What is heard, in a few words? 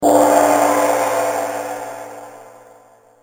relaxed Gong Ringtone